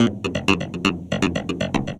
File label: Electric Piano, Loops, Keys